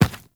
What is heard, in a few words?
Medium Run Default, Footsteps; Enemies; Warframe